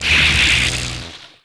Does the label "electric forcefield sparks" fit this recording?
Yes